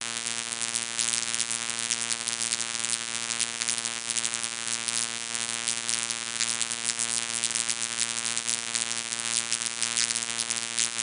Tone, Electric, Spark, Gritty, Thin, Crackle, Dense, Steady; Electric-Magnetic; Airborne Sound; Sound Design